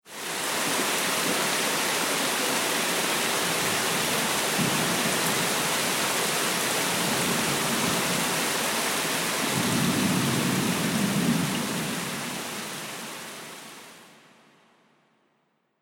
The Rainforest, outside ambience; Andrew Fleming

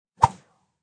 Arrow whizzes by; Bows and Arrows (Sound Effects:Combat)

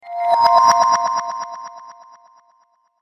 Shimmer Fairy Dust, magic; fantasy sound effect; Andrew Fleming; Twistedtracks

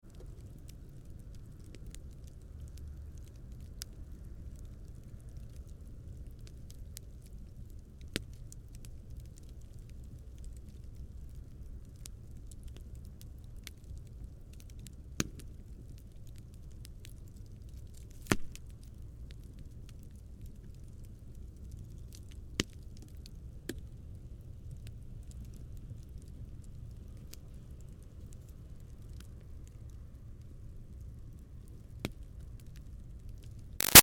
Fire Campfire Lite Crackles Snaps; Campfire Light; War

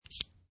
USB Retract; Sliding retractable USB thumbstick out - Variation 4; Computers; Michael Huang

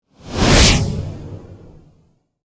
Rocket Launch Away, Warfare; Mortar; BlastWave

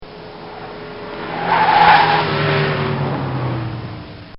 Volvo Screeching By; Cars (Sound Effects:Vehicles)

